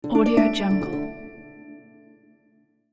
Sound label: celtic multimedia message